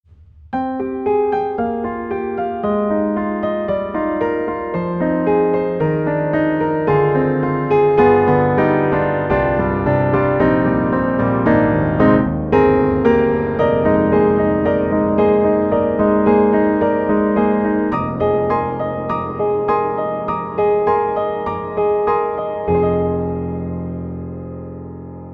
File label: Pianoteq D4 Cinematic, piano; MODARTT S.A.S.